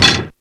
METAL, ELEC PRCS; East West; Global Sounds

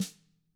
CAM HIT, CAMCO SNR; ILIO; Double Platinum Drums 1

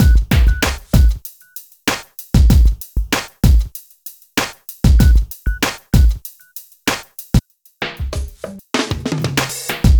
Drums, Hip Hop

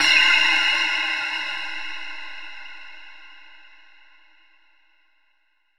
MICRO CHIME, ODD BELLS; Spectrasonic Distorted Reality 2

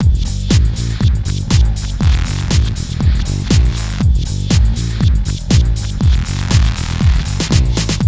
GHET ROL

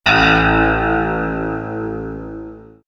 Swirls Twang, pads; X-Static Goldmine

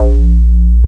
bass classic A#, Bass Shots, Chicago House

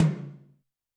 TOM Xfad M, Yamaha DTXpress-II

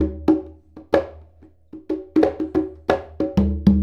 Congas; Essential Percussion

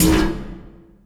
Oneshot; Sonic Foundry (Sony Creative Software); Esoterik Beatz

